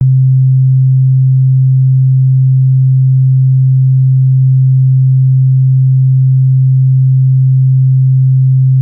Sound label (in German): sinus